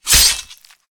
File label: slash, melee; Weapons; Civ